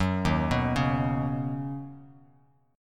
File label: Eb Minor 7th Sharp 5th Eb Bass Standard; arpeggiated